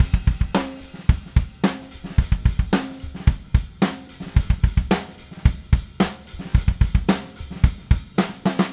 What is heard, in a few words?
Red Maple Groove 110, DW Red Maple Kit